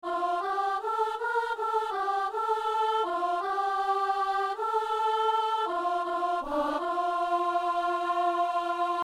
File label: soprano; Psaume 144 (145); Messe du 17 janvier à Saint-Denis